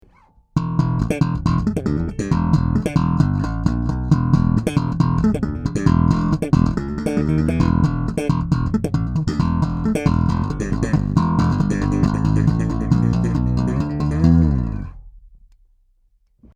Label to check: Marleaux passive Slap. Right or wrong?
Right